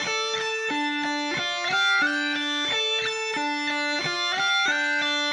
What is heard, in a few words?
Shift Delay, Guitars; Rock